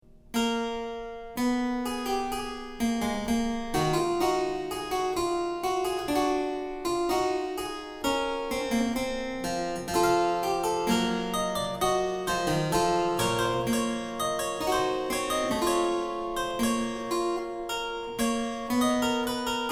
Toccata in E Minor, BWV 914 - un poco allegro (a 4 voci), Keyboard; JS Bach